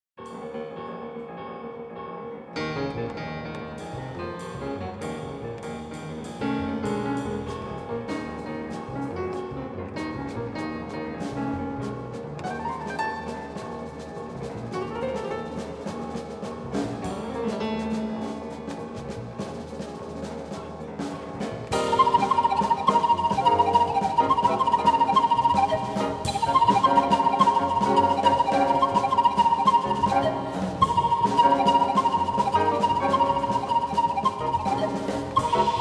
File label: Romanian Breaza re imagined in jazz